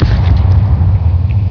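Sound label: body explode; mk2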